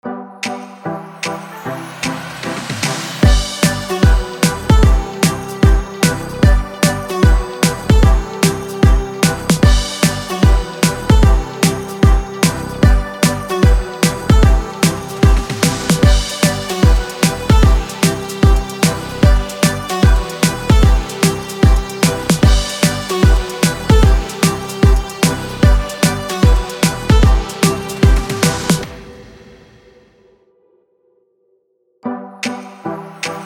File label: BED HOLIDAY BEATS GMAJ 150BPM